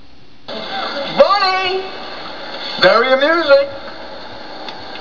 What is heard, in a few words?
funny; hood